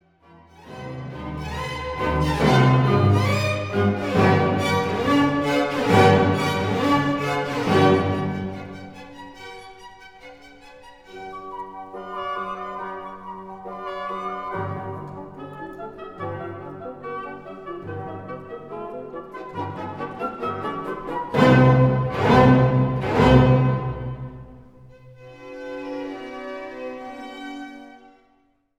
Orchestra; Mozart Jupiter; Bruggen

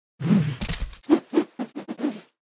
Spawn SFX; Lust Princess

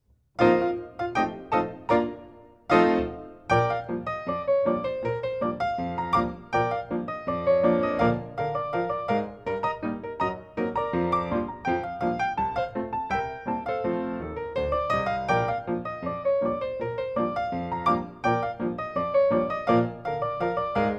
Frappe

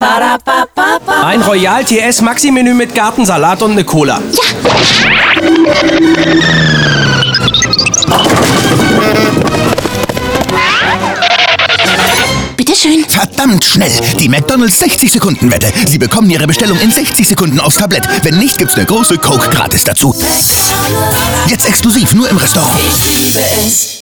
Funk

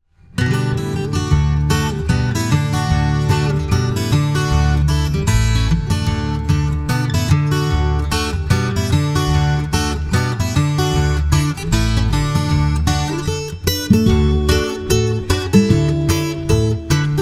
Blues Instrumental

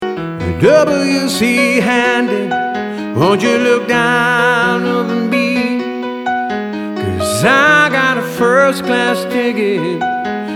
ballad n blues

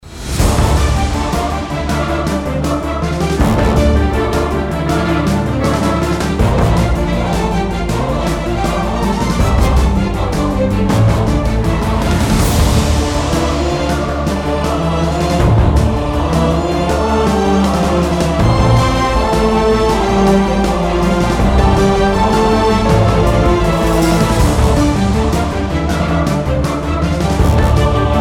Boss Battle

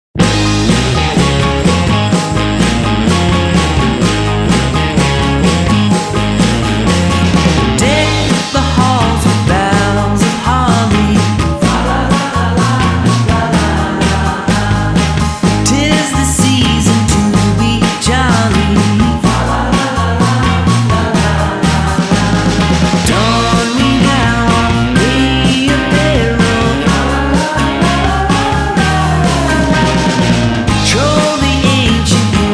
Deck the Halls

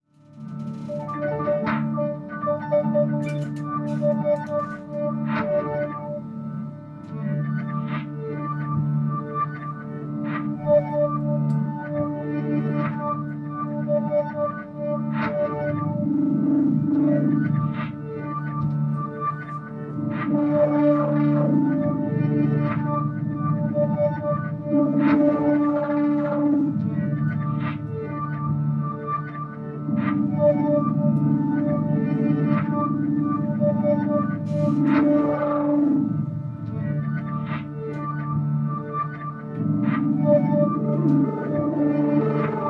reverse piano

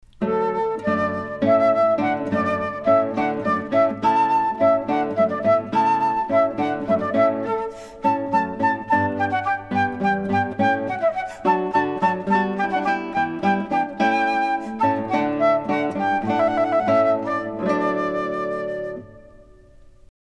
Hornpipe

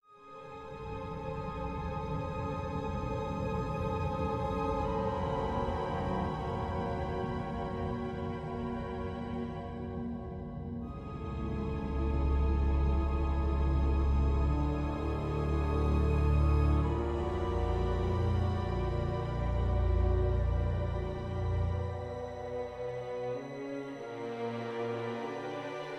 Dreamy Suspenseful Orchestral Electric Hybrid

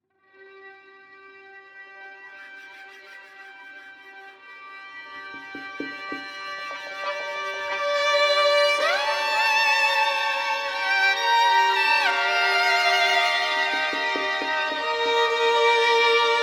Violin

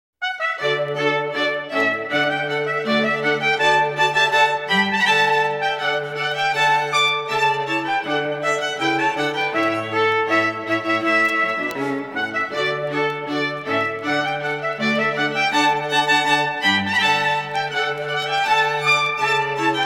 rondeu strings

